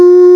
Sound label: beep